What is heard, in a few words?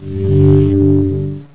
message tune